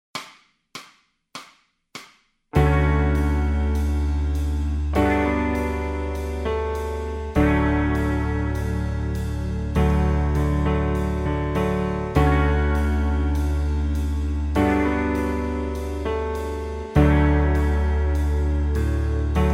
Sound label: guitar scales, backing tracks